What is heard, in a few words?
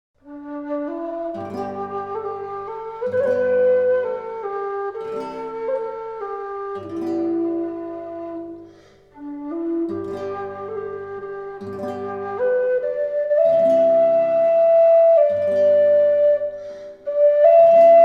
atma whistle low d